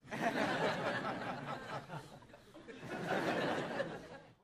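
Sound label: Crowd 100 Short Light Burst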